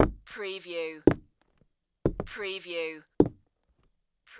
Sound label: Footsteps Walk Wood; Footstep sound effects; lynne publishing